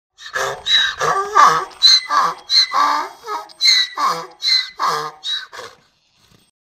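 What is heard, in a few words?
sounds of donkeys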